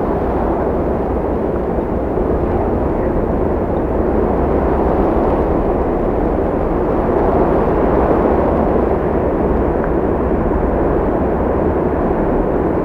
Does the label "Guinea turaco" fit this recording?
No